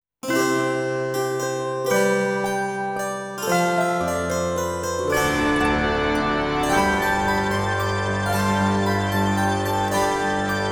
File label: Message; Infinite Undiscovery